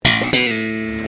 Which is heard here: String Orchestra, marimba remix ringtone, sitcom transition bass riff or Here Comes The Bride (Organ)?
sitcom transition bass riff